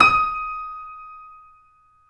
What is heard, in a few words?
KEY MCP, YC7 Piano ff; Roland L-CD701; ff YC7 St